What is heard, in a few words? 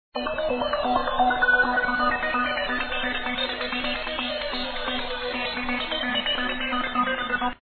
Combat Music; pencak silat 2002 gamerip online